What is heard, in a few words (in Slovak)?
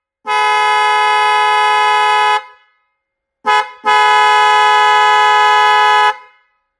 klakson 12v cervene slimaky 2 tonove